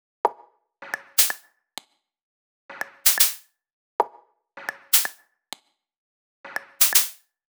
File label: 128BPM Drums, Tech House; Titano; Vengeance Samples; Bonus TechHouse Kits